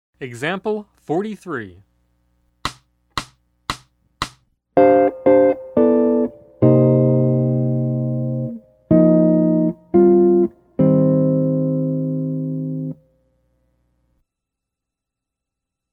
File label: Jazz; jue shi ji ta